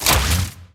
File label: charge; charged baby